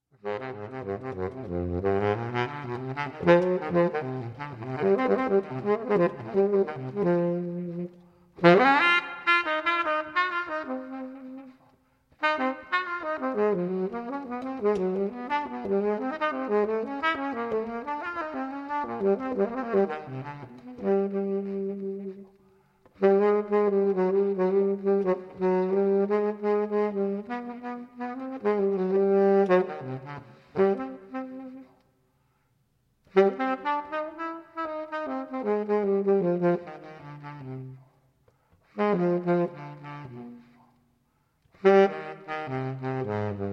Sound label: Rooster